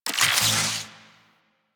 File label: Infuse Shock UI; Warframe; Warframes; Alchemist